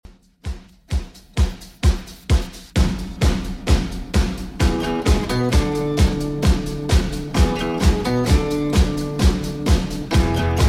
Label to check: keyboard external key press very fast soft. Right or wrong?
Wrong